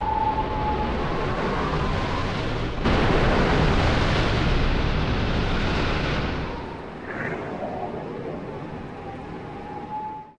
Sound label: Classic Outro Wind; Learn to Fly 2